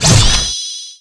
ONSLAUGHT RING ATT; smashing

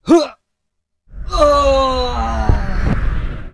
Mob die; Bossmob; 금전방; 황금제강귀; 2단계

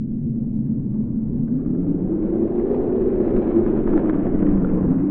wind no leaf; EnvSound

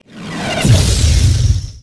skill shot fire; SkillSound

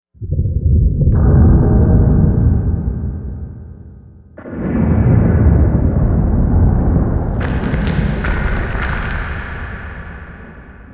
Dungeon Stone Dust; EnvSound

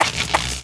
run; Monster; 레이드; 야투공야손

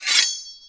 StartGame

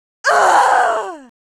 Mob die; Monster; 묘족; 가가공주

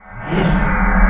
heal; SkillSound; 개방

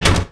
metalbox

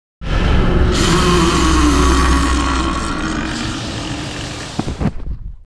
Mob die; 매산학

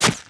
MOB BATTLE SHOTSWORD, Battle Mob Weapon

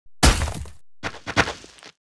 Mob die; Monster; 혈적광풍대; 혈적조원

